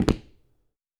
Dress shoe

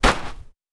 Snow

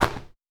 Snow

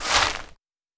Leaves

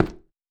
Cement

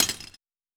Glass

